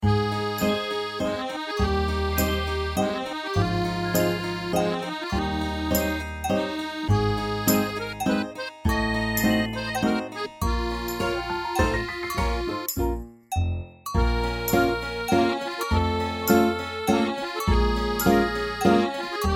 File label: 3:3 Waltz